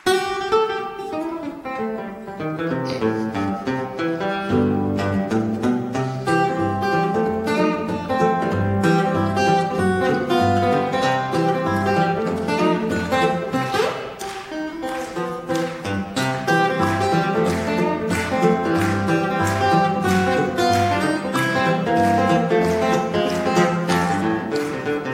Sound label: saturday night shuffle